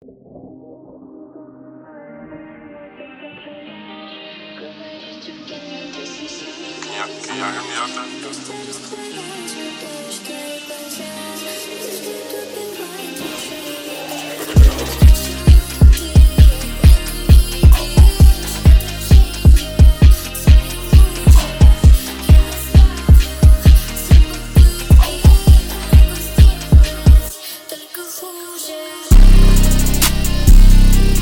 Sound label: 132bpm D major